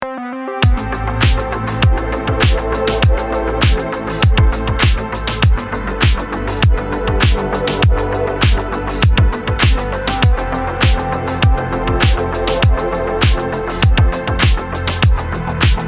Electronic; Vol2 Purified Main

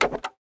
Face Machine Enter

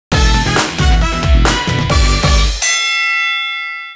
Boxing - Speed Bag - Next Round; Wii Sports Club

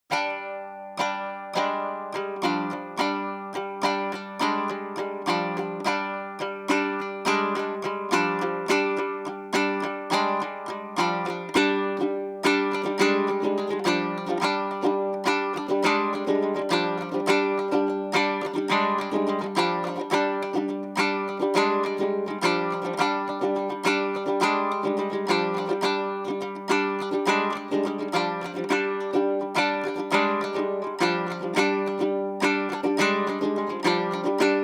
Tempo 105.0 bard kitara; assassin s creed valhalla

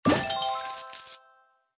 Gift Receive; Kitty Shorts Simulator; Android, iOS

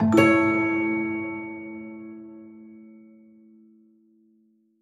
Crystal Hit Musical Normal; Cookie Run The Darkest Night VR

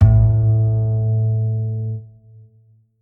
Bass Note; Bendy: Lone Wolf

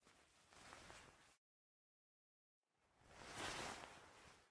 Scarf Open; MiSide

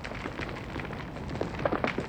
Skid Loop

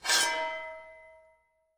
Sword; Stumble Guys; Android

